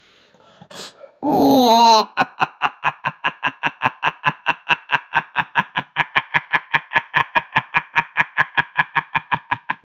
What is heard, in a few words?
evil cat laugh